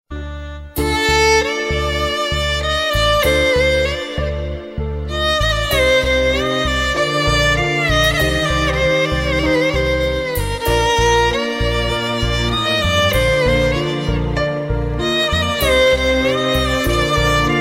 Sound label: Lovely Violin